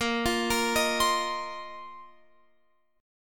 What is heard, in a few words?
A sharp Suspended 2nd Suspended 4th down Guitar Open F; A#sus2sus4 arpeggiated